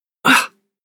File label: Human Fx Grunt; Grunt Sound Effects; Human Fx - Grunt 6